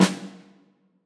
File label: Orchestra Snare